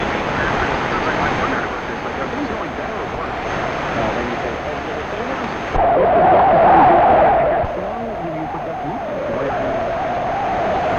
Sync Comp 4840 PL660; WWCR

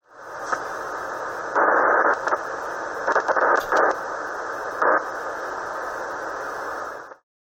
Auto Mute

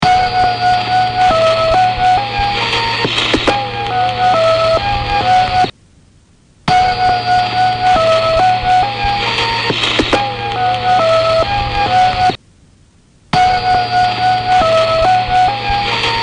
12 note poly tone

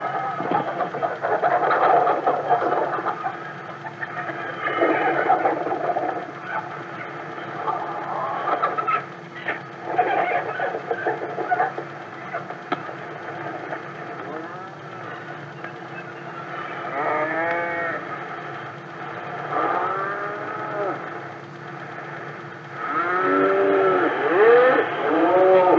Radio Botswana barnyard IS

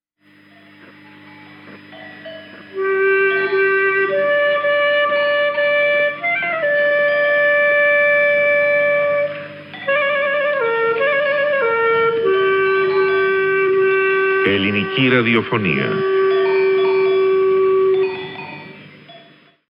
Ringtone

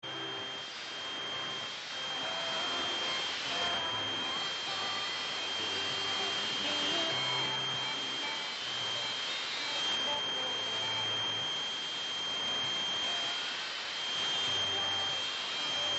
RA Sample; Melson M8

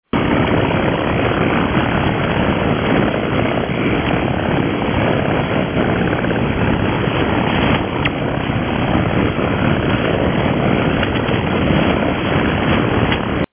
Jammer

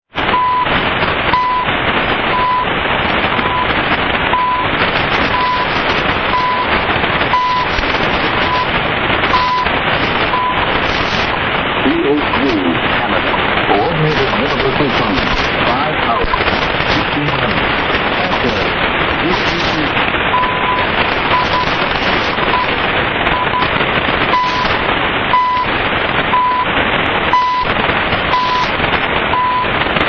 3330 CHU Canada

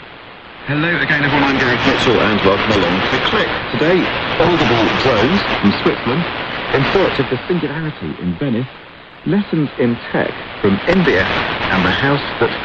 click

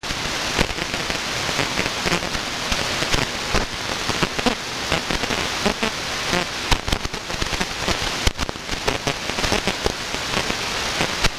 18.118MHz With REE Spurs

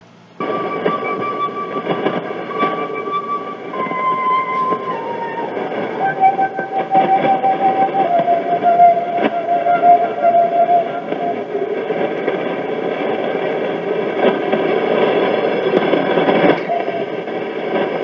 Mystery Interval Signal

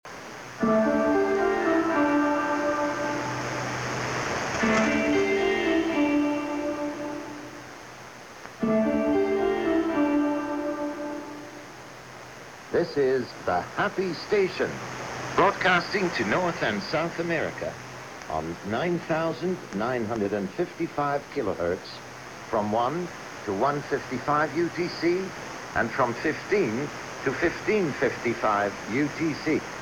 interval signal; happy station

